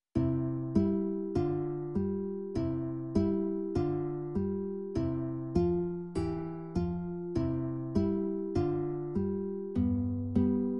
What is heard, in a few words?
accomp; beginner classical guitar lessons; i-m-i-m movement of your right hand